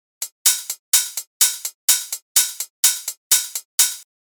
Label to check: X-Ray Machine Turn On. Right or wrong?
Wrong